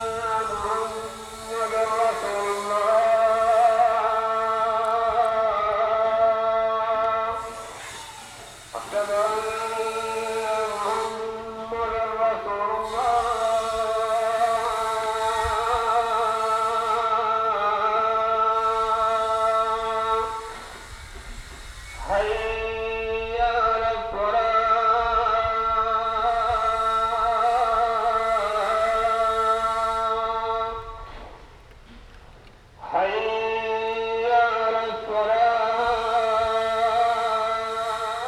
Mosque and dogs at Sulka shipyards, South India street recordings